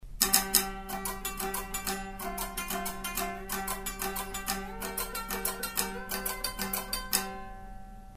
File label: no amp